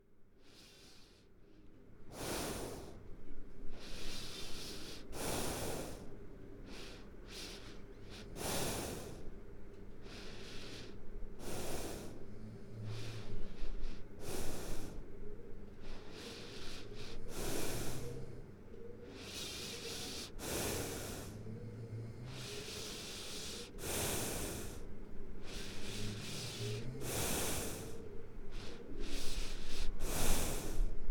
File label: Snoring